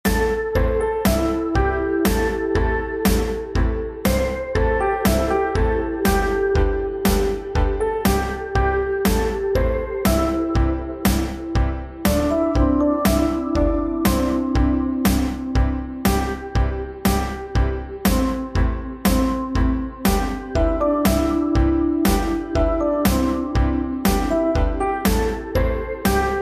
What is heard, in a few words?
PSE staccato